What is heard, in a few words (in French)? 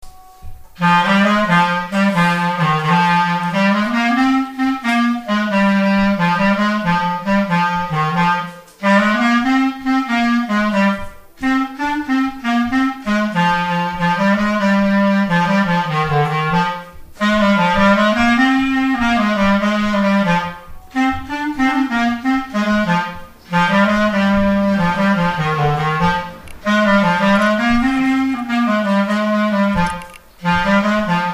marche, clarinette